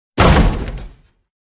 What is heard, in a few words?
door close